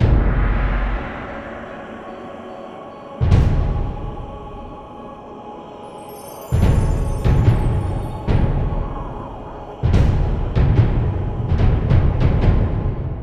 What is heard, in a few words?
freespin outro loop; netent